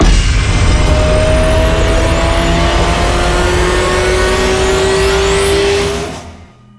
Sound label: near Win; hotline